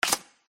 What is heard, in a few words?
card slap